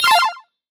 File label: Retro FX; Cymatics; Video Game Sample Pack Vol 1